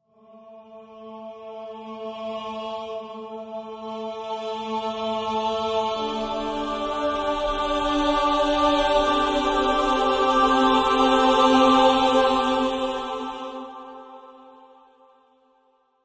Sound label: Choir Phrase Dm 160, Choir fade in loop Dm 160 bpm; Choir Loops